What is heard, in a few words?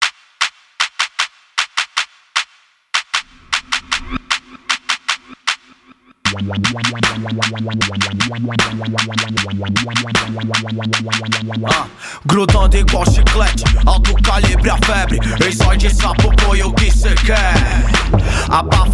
rap